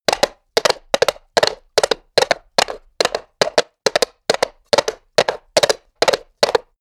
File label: horse hooves clack gallop asphalt; natur-tiere; pferde